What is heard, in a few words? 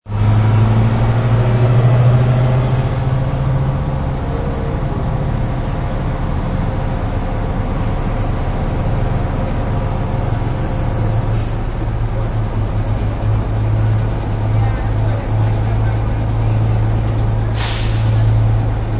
Bus Stop, Sounds of a bus stop with buses pulling up and driving away, engines idling; Sounds of a bus stop with buses pulling up and driving away, engines idling, and air released; Transportation